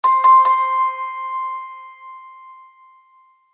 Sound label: last spin